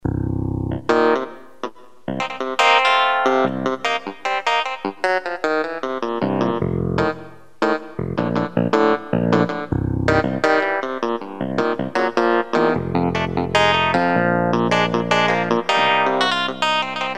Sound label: Keyboard